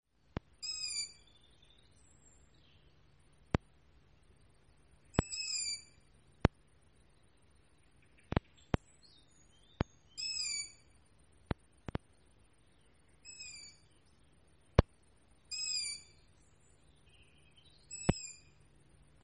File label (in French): Cris oiseaux nocturnes